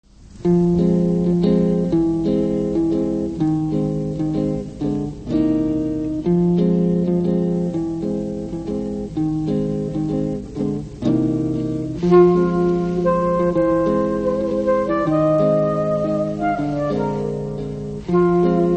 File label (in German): Saxophon